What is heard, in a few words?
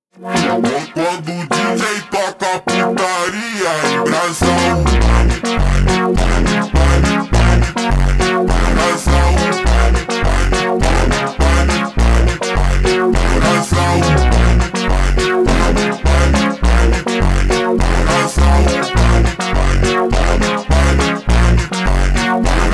Montagem super Slowed